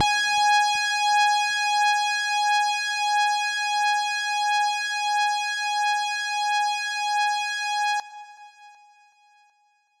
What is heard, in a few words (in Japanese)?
音程 女性 G4